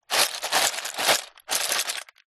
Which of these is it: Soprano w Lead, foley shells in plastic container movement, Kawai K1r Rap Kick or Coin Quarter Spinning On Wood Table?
foley shells in plastic container movement